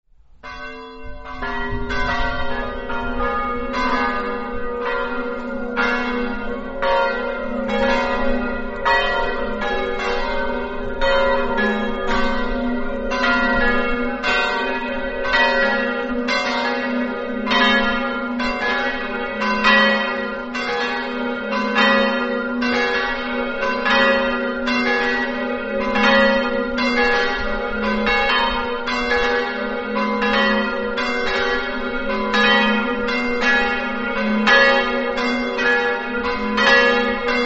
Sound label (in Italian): campane